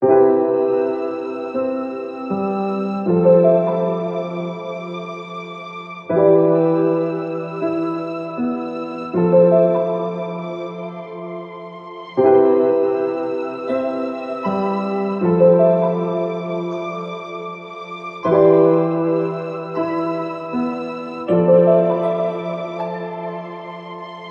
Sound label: 79 BPM C Maj; Gems Vol 2; Memories; Cymatics; Gems Free Teaser Pack